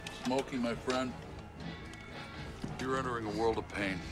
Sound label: pain